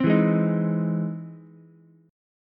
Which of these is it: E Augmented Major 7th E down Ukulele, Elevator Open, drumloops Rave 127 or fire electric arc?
E Augmented Major 7th E down Ukulele